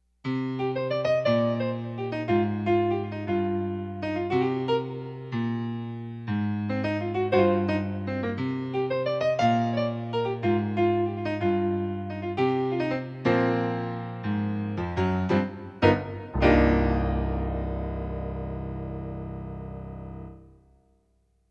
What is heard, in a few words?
Medium Swing Blues